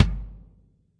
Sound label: real kick; REAL LIVE KITS; A Real Freakin Drum Kit